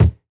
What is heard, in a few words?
Kick, jungle breaks drum sounds